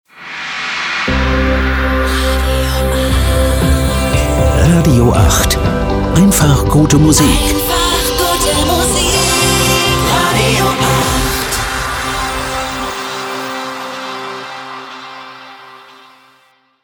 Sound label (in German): RADIO Transition Pop aktuell soft aktuell SYNTH